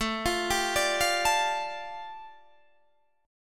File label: A 7th Suspended 4th Sharp 5th A Guitar Standard, chord; arpeggiated